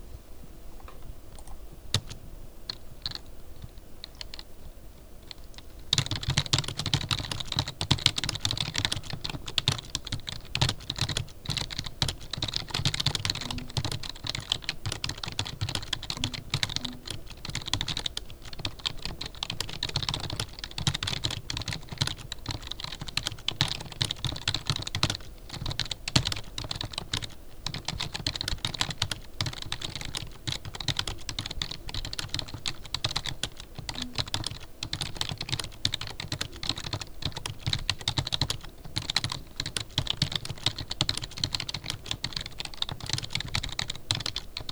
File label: microsoft rt2300, keyboard